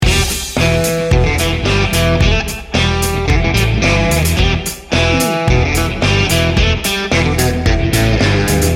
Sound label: big rig run loop